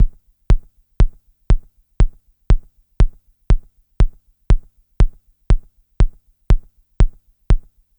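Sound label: 120 kick loop clicky velocity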